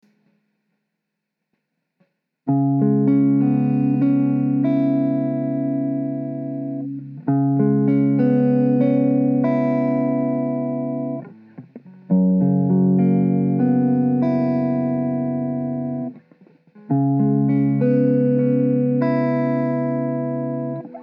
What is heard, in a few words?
C#m100 acoustic